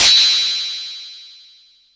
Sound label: METAL SYNTH; WATERFN